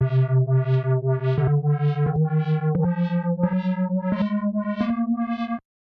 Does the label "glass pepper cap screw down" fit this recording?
No